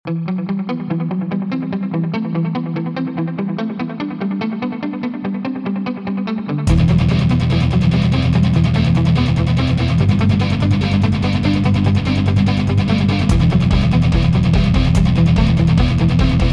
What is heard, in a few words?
Hard Rock; Ultimate Zombie Defense